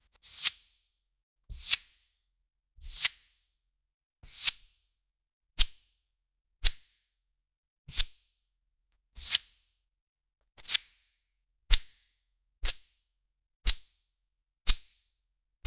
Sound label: skipping rope